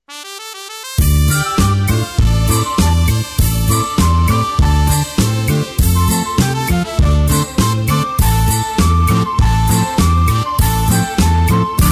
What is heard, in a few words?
beat